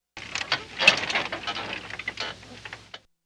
Bed Squeak Getting Up From Bed; bed movement

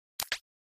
Button Click Elect

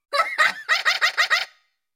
Female Robot Laughs, servos

